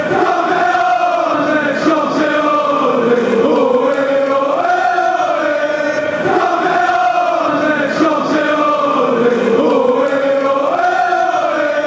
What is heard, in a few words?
champions, chants